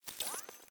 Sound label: armor; smite; ahpuch skin10